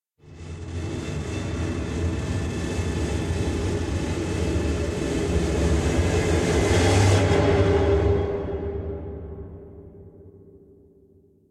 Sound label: piano notes; SyrinScape; Madness in the library